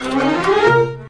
mideast climbing notes; WORLD

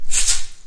rainstick shaker; ETHNIC and WORLD PERCUSSION

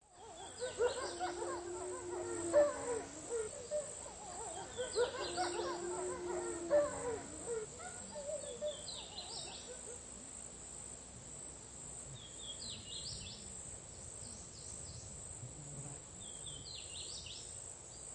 wolf pack howls; Zoo and Nature

